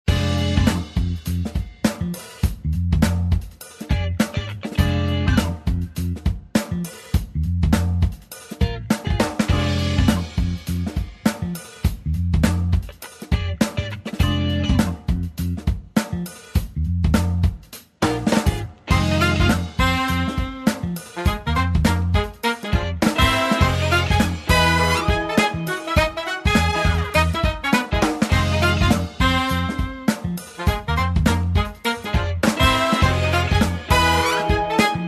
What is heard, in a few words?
wheel bgm